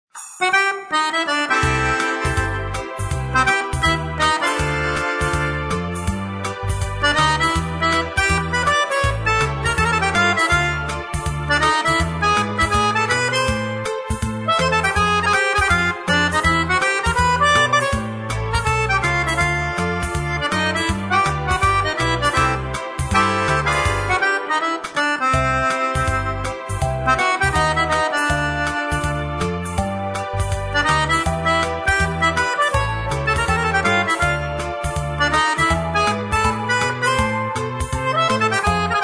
standard piccolo

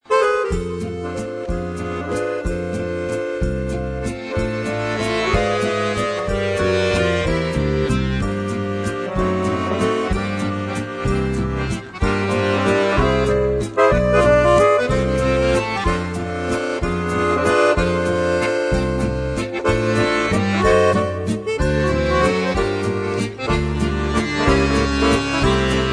professional chromatic

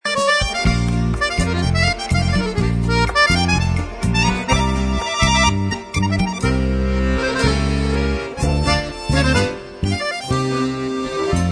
standard chromatic junior

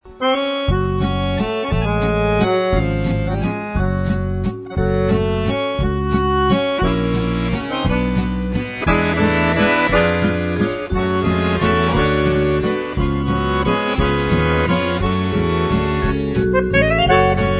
professional 34 45 piccolo

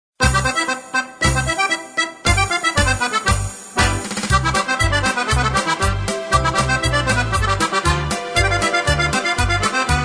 professional supermusette

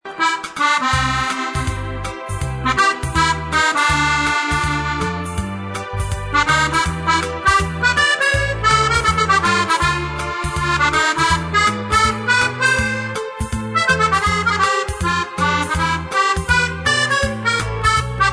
standard chromatic musette

